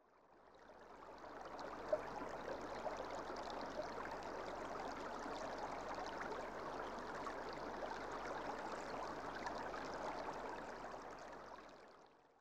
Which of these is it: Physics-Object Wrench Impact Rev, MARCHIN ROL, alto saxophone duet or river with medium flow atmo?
river with medium flow atmo